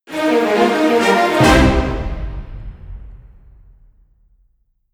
jingle victory

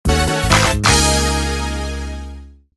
Jingle Clap

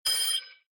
Kill Person; Blockman Go; Android

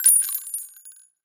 bullet casing 7mm bullets drop pile cement

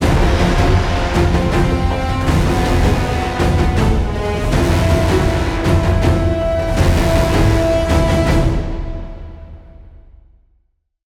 Combat High Threat End

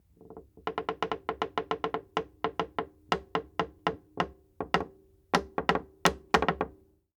wood creak metal ring

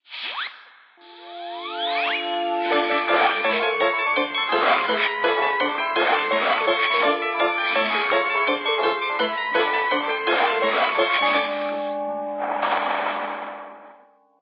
Arcade Fight 8bit

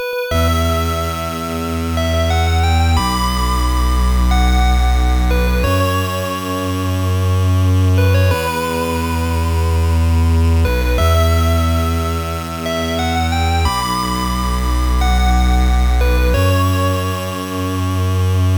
Music - BGM; fushigi no umi no nadia the secret of blue water; pc engine cd; turbografx 16

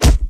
Bassthud, bass thud; Friday the 13th: Killer Puzzle

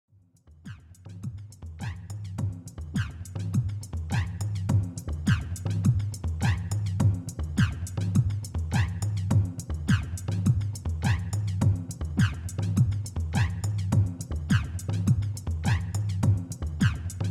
Mall Music

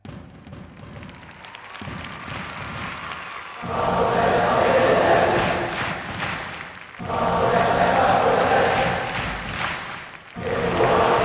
Chants - European Type; virtua striker 4 ver. 2006

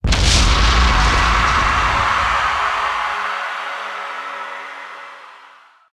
final hit

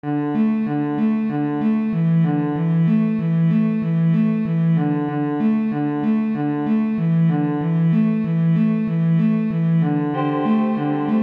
Ambient Maze; Wii U gamerip 2015

